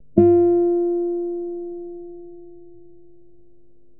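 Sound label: arfa odinochnaya priglushennaya, harp single muffled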